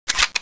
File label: m16 clip release